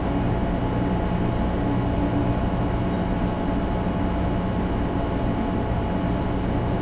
vend

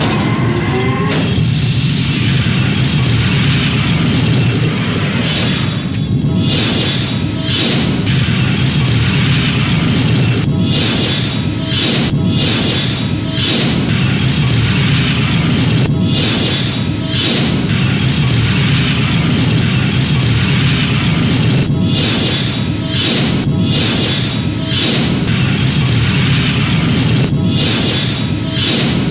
activate stargate

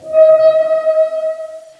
misc amb

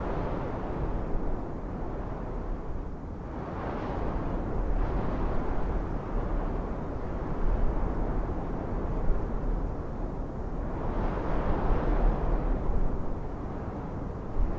softwind; docks

